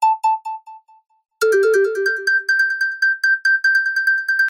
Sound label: wii connecting sound